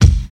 mid heavy bass drum single shot G sharp key, kick sound tuned to the G# note